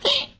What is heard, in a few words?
piglette damage; Angry Birds Seasons